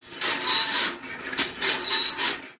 household toaster metal rack slide movement close up; zapsplat